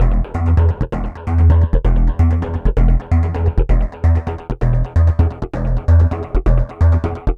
Bass Arp, Basses; Dr. Fink's Funk Factory

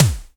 DRM DEEP, Analog Drums; Roland L-CD701

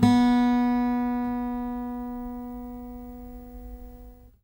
ACSMEDPICK, Guitar; Quantum Leap; Guitar & Bass

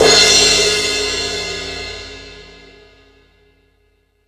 Ambient Rock, drum machine

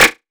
Dirt, Hit; Sonic Foundry (Sony Creative Software) - Future Retro Dance Excursions

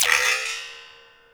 Springs, cartoon Foley Fx; musicradar; cartoon caper

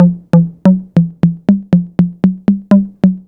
808 tape hi tom

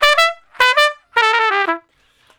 Trumpet Straight (E); Solos

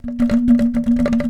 A#2 anklung, Java; AKAI S6000; WorldSounds

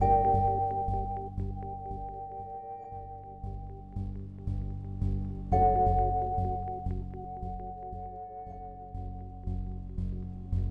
Melody

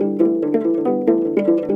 HRP AF HRP, Afro Harp Phr

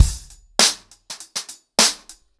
100BPM, ACOUSTIC DRUMS

